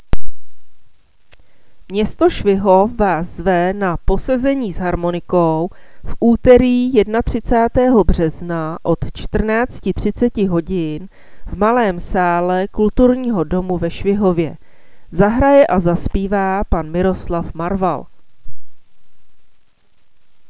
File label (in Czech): harmoniky